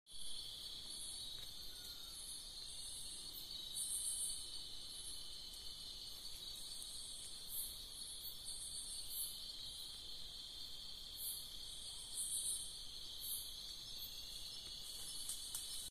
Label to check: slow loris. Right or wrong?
Right